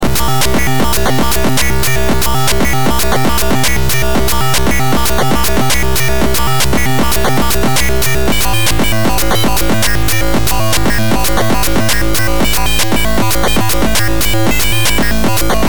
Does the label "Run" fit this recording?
No